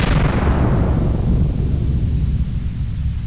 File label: EXPLODE